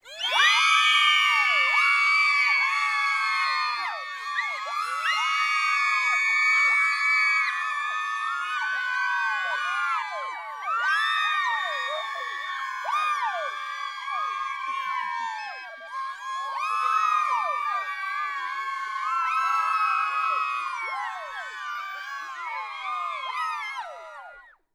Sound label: EXT GROUP Female Celebration Scream, Battle Crowd; Coll Anderson